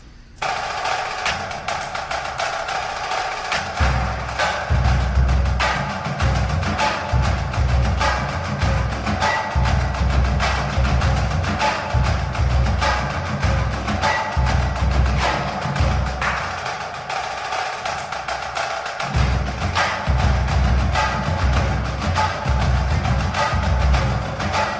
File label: drum track